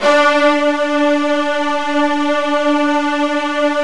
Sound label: Virtuoso Strings